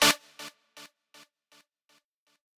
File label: lead